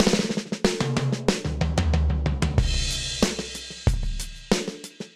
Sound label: Fill Old School 93bpm, Session Drums; Clockwork; Ableton; Factory Packs; Session Drums Studio